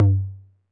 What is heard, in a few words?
Tom Low 808 Sat, Saturated